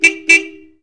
klaxon; Mobiclic46

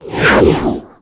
luge spin; PC Gamer IT CD 48; trickstyle; TSdemo

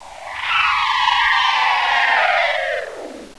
wraith

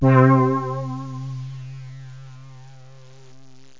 synth; Zoom - Release 2 (1996); Active Software; synths

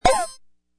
Bonus Release; breakawish

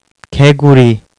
frog

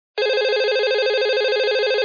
fax; MRSHot2003

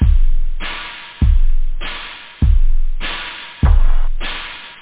tom, loop house; da capo vol1; instruments

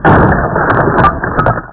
demol

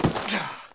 player land; PC Gamer IT CD 41; Daikatana

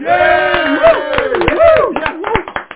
CHEER; Ultimatum; Amiga

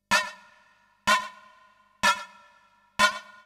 HOUSE 125; eJay

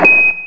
ping; Select Soft Publishing Stock Clips Multimedia Clips; buttons

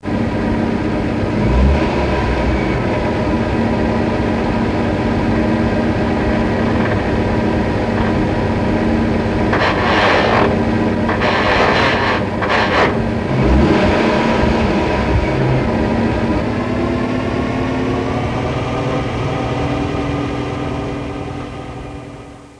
gears; WAV Sound Effects; transpo; busses

